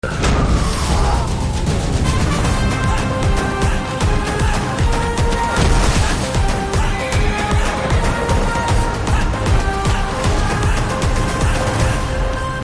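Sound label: trailer tamil entry background music ringtone; rrr; ram charan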